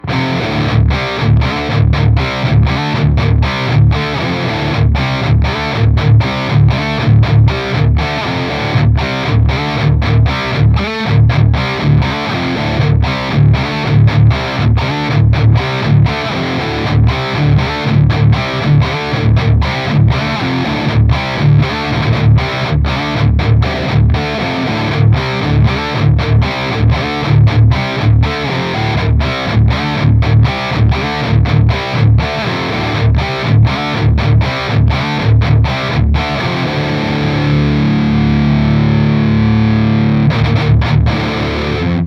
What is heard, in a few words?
Neve Preamp; UAD